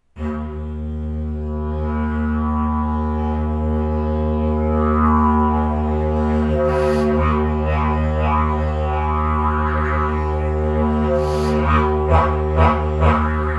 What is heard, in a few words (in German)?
Kunststoffdidge Soundprobe